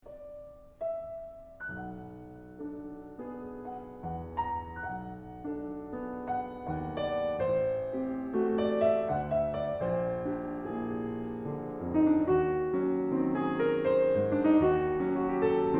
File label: waltz